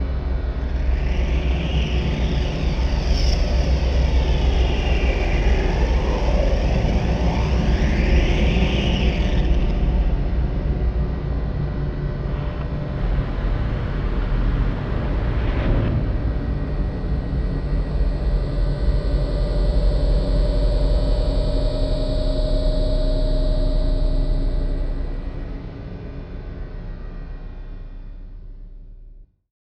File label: Static Flange; Adobe; Production Element; Imaging